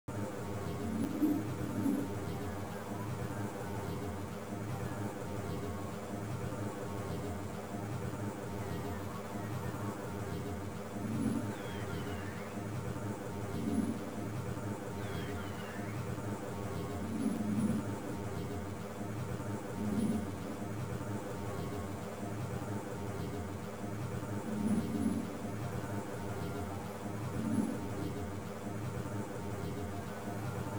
Atmos; Level 2; Valhalla and the Lord of Infinity; Amiga gamerip 1994

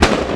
Exhaust Fire

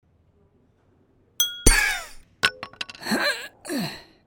Hammer; Stealing The Diamond; Diamond Solo

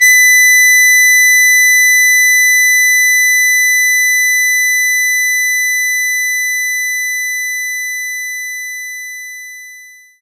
Harmonica B5

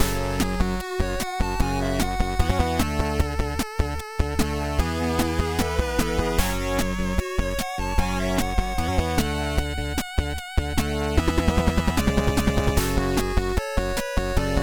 menu music; brave soldier invasion of cyborgs; ps4; xbox series xs

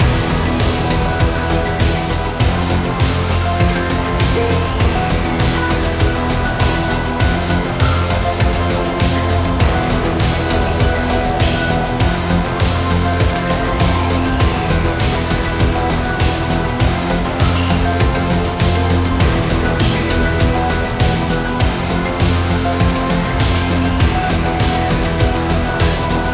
BGM Club

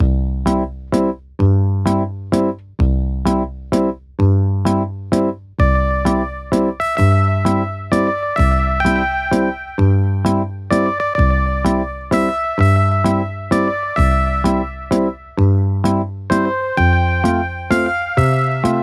CASIO Waltz; Wunderling

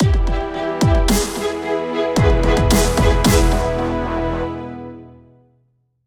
Music Stings; Crater

